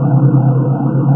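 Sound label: skull hover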